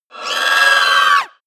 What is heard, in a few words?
wraith die scream; schreie